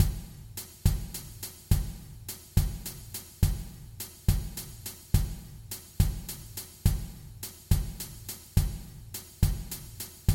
Triplet drum track

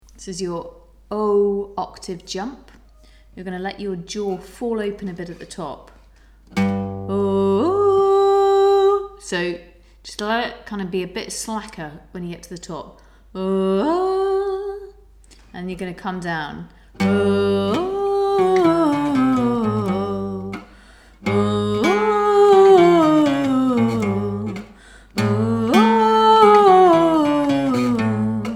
octave jump

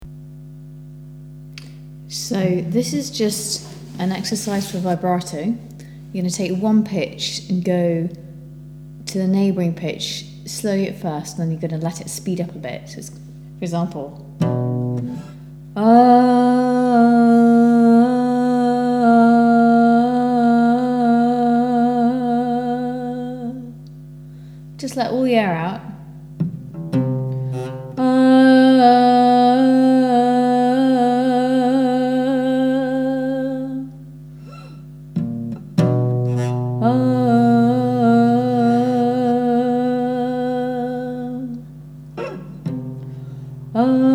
vibrato pitch shift

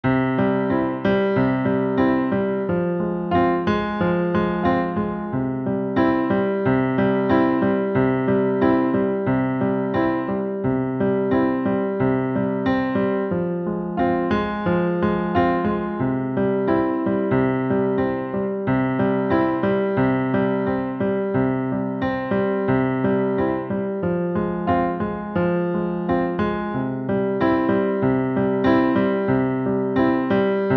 Improvisation in C

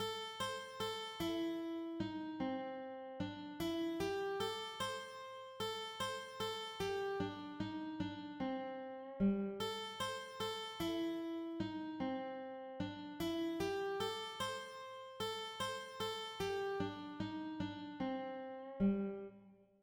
Eighth note triplets phrases only

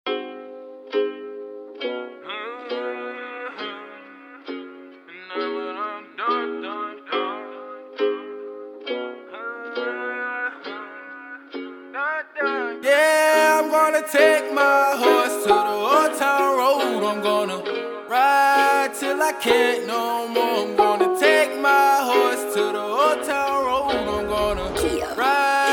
beat rhythm training

